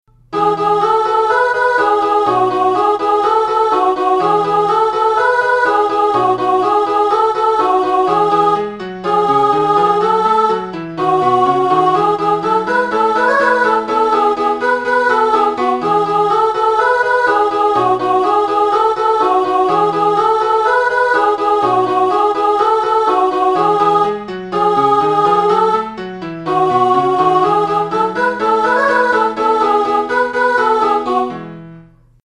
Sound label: mozart mezzo; "La petite musique de nuit" de W.-A. Mozart; lyon